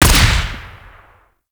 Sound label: Quill Dart Gun Shot; Warframe